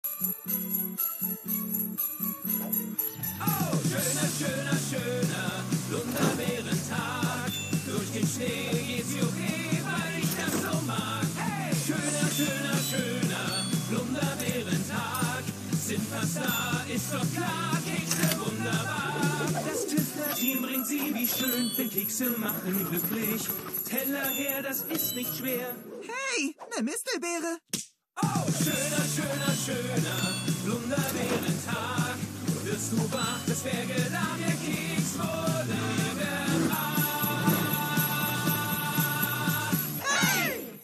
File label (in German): Gesang Synchron; LOFT VOICES